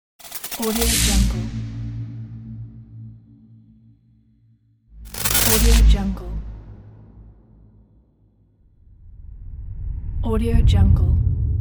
glitch transition woosh

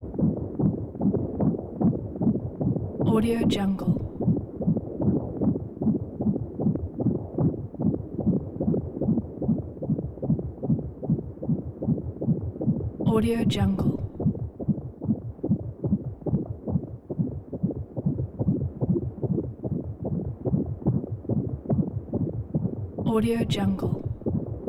fetal heartbeat fast rhythmic pumping beating

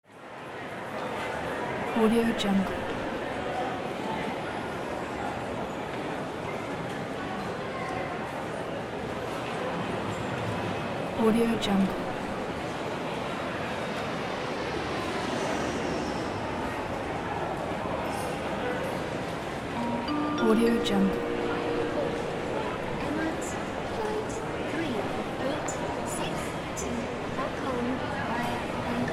airport ambience

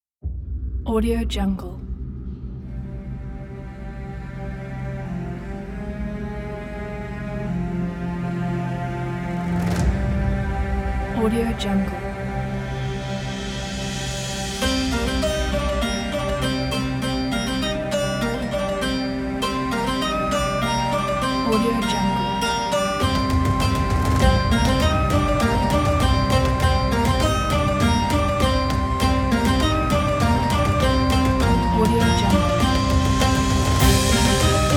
fantasy story; the witcher

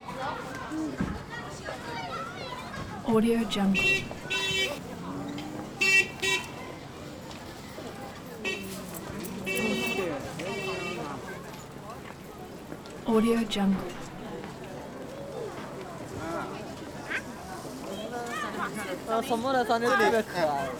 shanghai shops china pedestrians

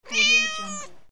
cat meow

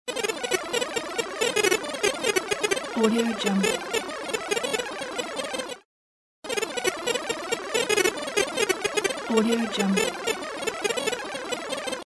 rewind; rolstudio

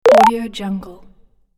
infographic clean open

